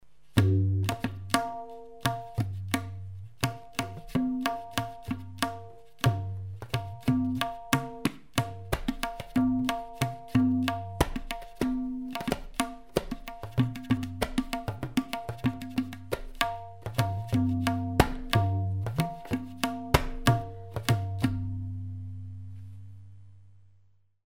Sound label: Tabla solo